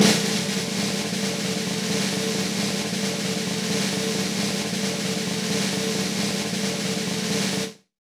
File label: V-Pop Snare St Roll, Roland BK-5